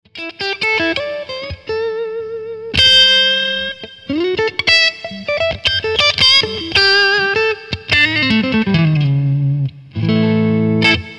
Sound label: Clean, Cyber Deluxe